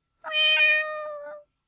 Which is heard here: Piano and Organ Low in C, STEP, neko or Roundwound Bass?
neko